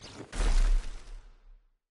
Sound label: fall offscreen; frozen rush